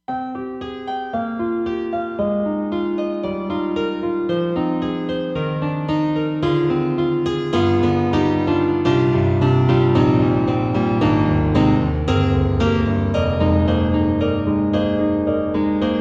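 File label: Roland SD-50 Stage Piano 2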